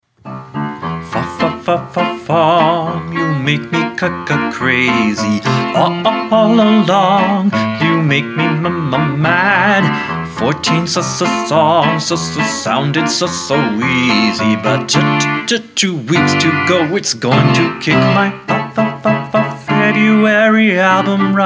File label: march